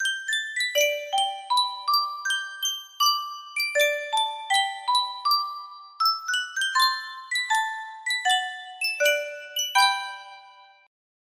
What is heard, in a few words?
sankyo miniature music box